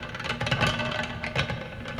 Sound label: ambient battle; World Of Warships